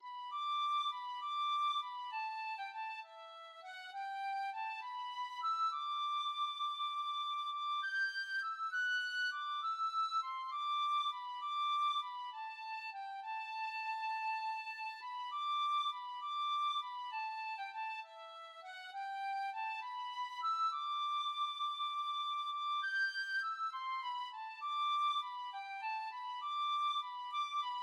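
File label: penny whistle, tin whistle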